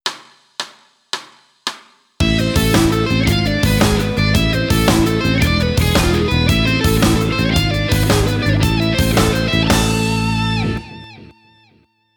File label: One Minute Lick slow, guitar; Guitar Techniques 2018 Issue 289 December